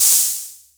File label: Jomox Hat; techno drum fx; Analogue DrumHits